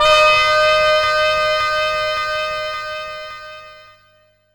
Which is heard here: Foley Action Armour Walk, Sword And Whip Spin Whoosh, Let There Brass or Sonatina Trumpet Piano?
Let There Brass